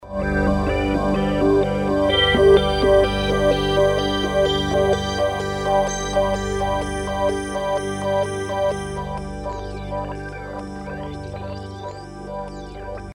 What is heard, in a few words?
pad lfo, emu carnaval; Motorola 68000 CPU (mc68hc00fn10) system handles up to 32 voices polyphony